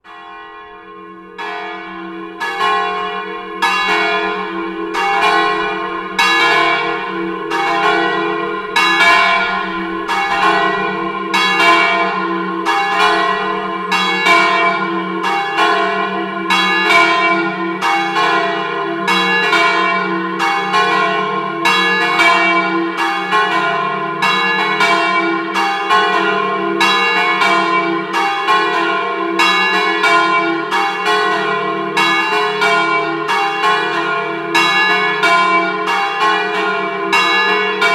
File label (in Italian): Cimolais, campane